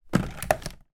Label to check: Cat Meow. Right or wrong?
Wrong